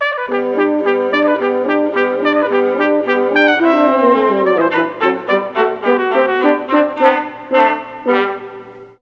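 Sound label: Hn Trp Vla, horn trumpet viola; Juilliard Music Adventure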